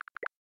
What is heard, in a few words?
samsung galaxy air button open